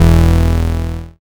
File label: BAS MKS-50, Roland